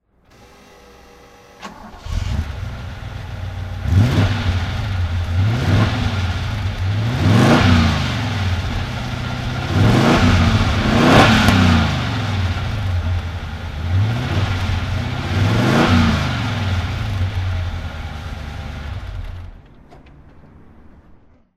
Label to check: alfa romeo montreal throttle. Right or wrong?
Right